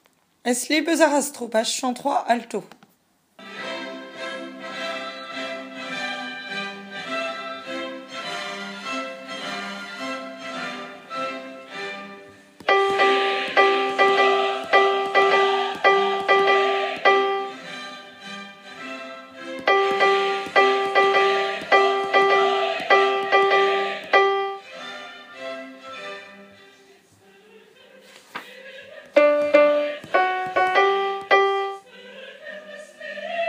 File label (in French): alto; die zauberflote pupitres